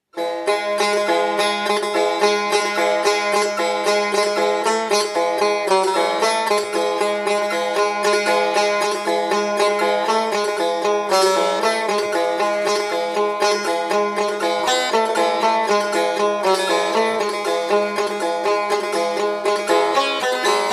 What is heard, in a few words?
setar